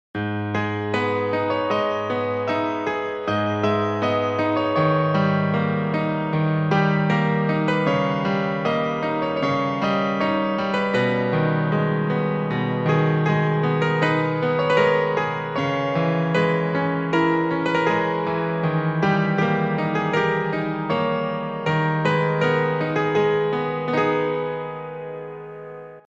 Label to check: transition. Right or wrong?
Wrong